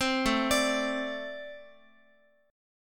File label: A-Minor Double Flat 5th A Guitar; arpeggiated; JGuitar